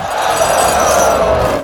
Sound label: Ambiences, Places and Ambience